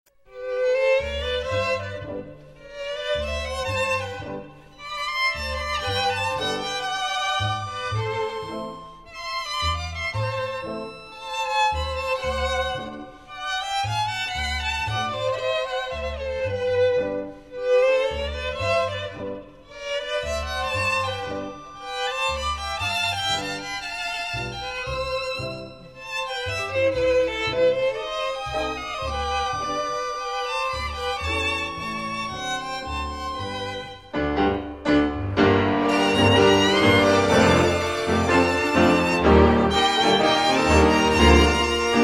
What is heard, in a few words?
Tango